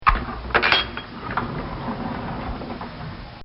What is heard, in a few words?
Sliding Stall Door Open; Doors